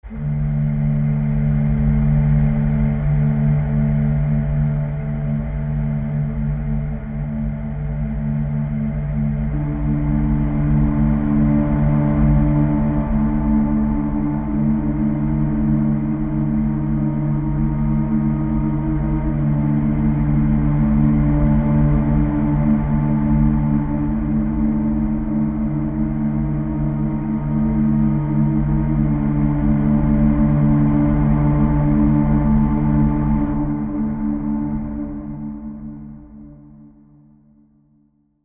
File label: Hushed Mystery Whisper Pad